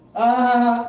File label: hahaha